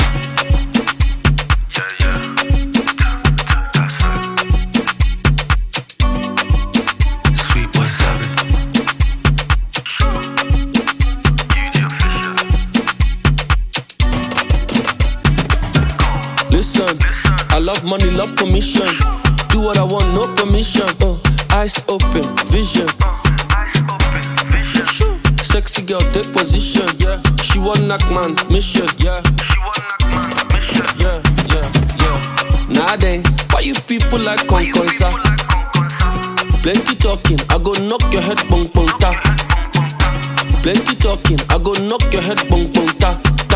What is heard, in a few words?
Simple Life Acoustic